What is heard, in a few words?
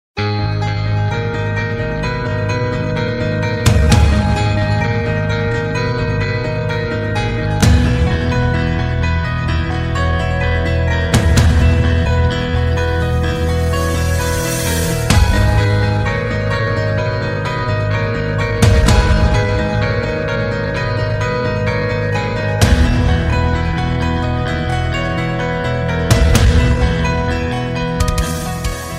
Metal Bestring